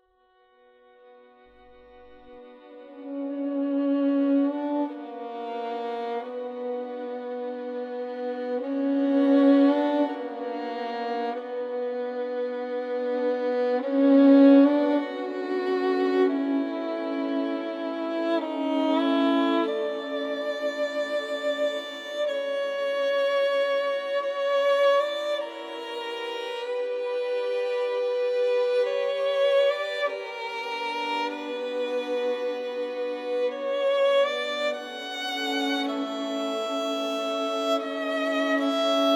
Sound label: violin